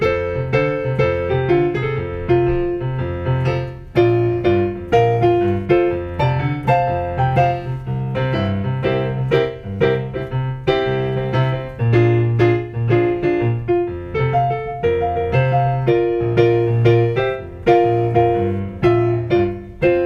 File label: accompaniment; reuben side